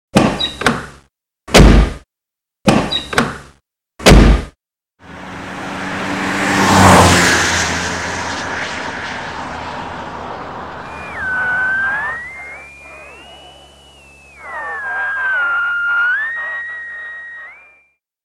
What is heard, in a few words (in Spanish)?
Efectos